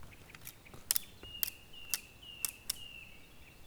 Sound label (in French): Fagot brindilles seches CHOCS; Percu Bois